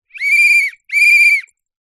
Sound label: whistle plastic toy; foley; zapsplat